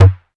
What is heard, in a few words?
Perc Alert, 808; 808 Percs; Slate; Vintage Drum Machines Volume 2 808 Edition